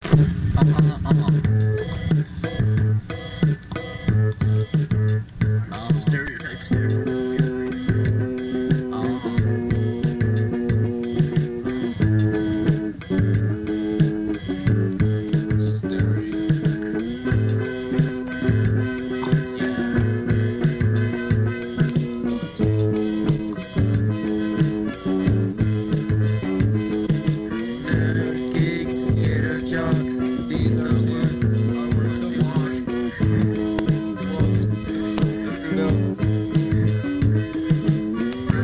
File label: stereotype snare